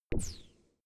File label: space laser gun bubble shot hard; soundpool